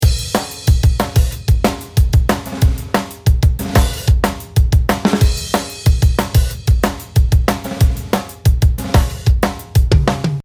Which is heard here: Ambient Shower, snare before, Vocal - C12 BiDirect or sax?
snare before